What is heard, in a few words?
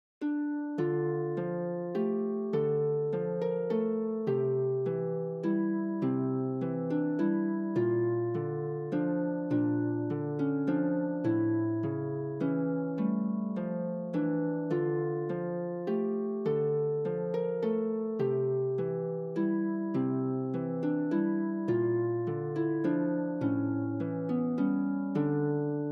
what child is this harp